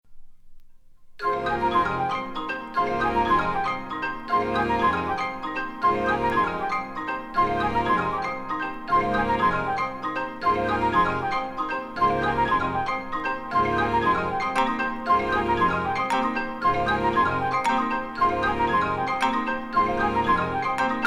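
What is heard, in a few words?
music for a large ensemble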